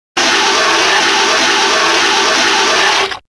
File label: long spray, Firehouse; kids-tetris